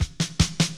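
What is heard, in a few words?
snare, drums; mercure drums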